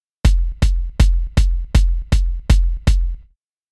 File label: kick